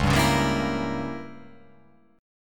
C sharp Diminished 7th C sharp down

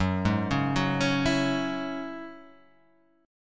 F sharp 7th Suspended 2nd F sharp down

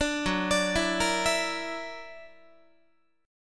F sharp Augmented 7th, down, Guitar; arpeggiated; F#+7; 17,14,17,14,14,15; 4A,4E,5A,5D,5G#,6C#

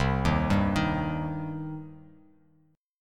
C Minor C; arpeggiated; JGuitar

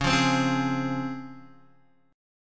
C sharp Major 7th C sharp Guitar DADGAD

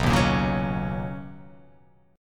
B Major 9th B, chord; BM9; strummed; x,2,1,3,1,1,3,x

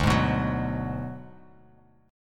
D 6th Flat 5th D down, chord

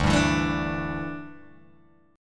D Suspended 2nd Suspended 4th, Guitar, Open G

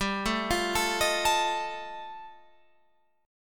G 7th Suspended 2nd Sharp 5th G, Guitar Open F; G7sus2#5 arpeggiated

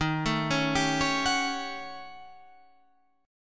Eb 7th Sharp 9th Flat 5th Eb; arpeggiated; 11,12,11,12,14,14

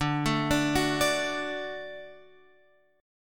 D-Major, D, Guitar, Open F; x,9,9,9,9,9; arpeggiated; JGuitar

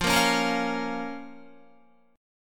F Suspended 4th Sharp 5th F down Guitar; strummed; 4F,4A,5C,5G,5C,6E; JGuitar

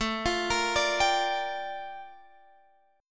Bb Major 13th A

